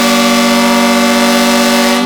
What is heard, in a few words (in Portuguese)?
buzina